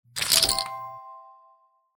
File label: roulette win, casino